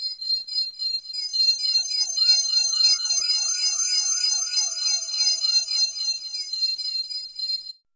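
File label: HIGH sequence, SYNTH FX; AMG - Vince Clarke Lucky Bastard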